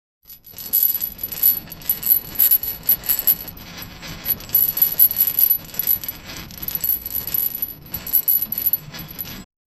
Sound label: chain